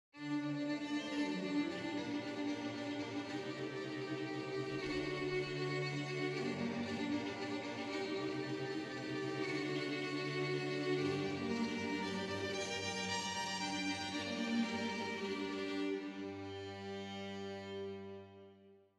concert hall quartet; ZahorikNeal

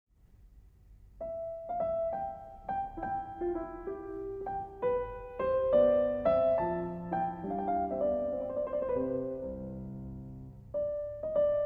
modern piano; Scarlatti

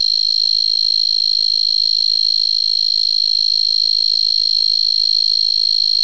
F nigricornis

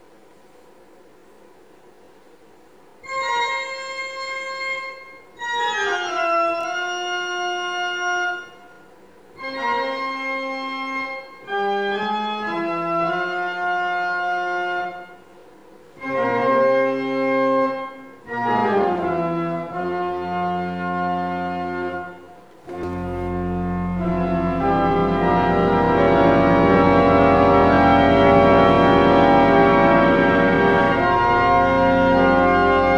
Venus Organ

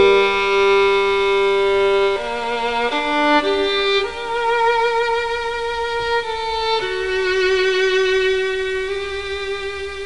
Bruch with hard cut above and below 1 kHz

